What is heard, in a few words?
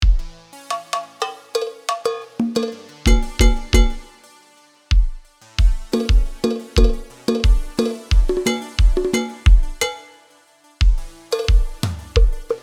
Perc Fxs; Silex